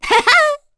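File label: Vox Laugh, korean; Cecilia; kingsraid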